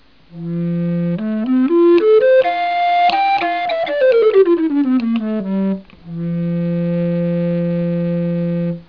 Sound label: recorder bass range